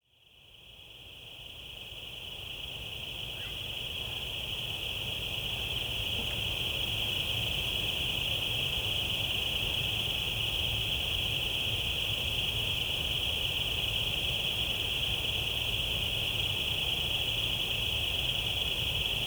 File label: white noise summer morning